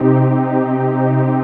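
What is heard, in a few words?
chord pad, Pads; Best Service; XX Large - Pads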